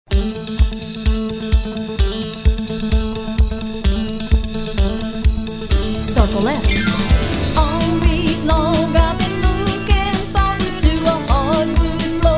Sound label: vocal